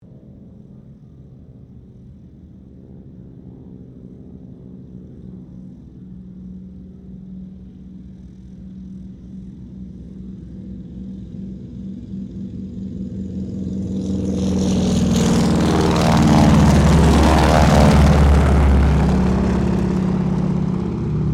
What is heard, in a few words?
AIRCRAFT CAC CA-25 WINJEEL PAIR TAKEOFF; RAAF Winjeel fighter trainer; Airplanes; Vehicles